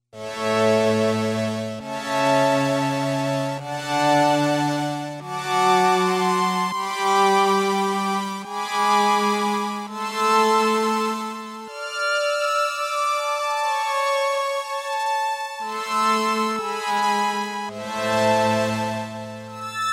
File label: Strings, Casio CSM-1; Tones